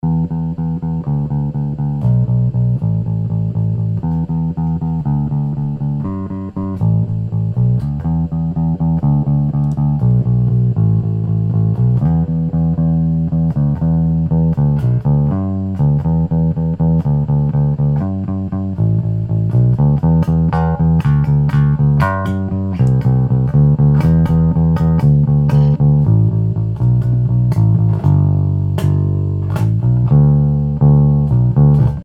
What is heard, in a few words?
bass loop